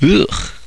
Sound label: YEECH; mordor